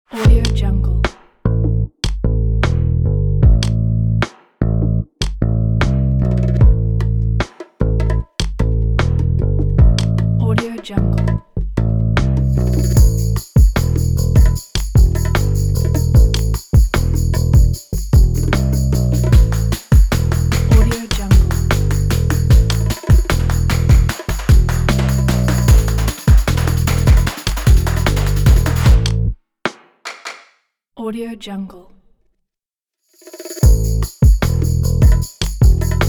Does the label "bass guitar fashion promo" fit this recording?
Yes